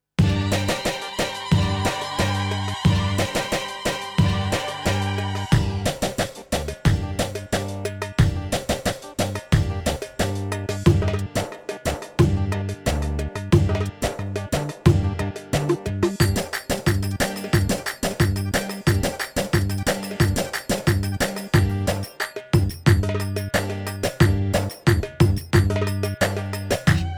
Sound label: Zaffa